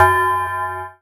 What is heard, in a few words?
Synth Shot F#; Mutekki Media; Vengeance Electroshock Vol.2